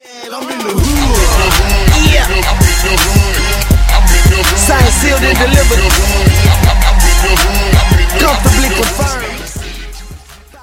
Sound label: win, music